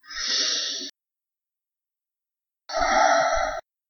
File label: breath mono, first aid training